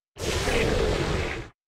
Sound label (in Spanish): Grito de Sandaconda